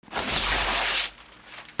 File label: RIPPING